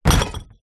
impacts glass bottle hit junk in plastic bin no smash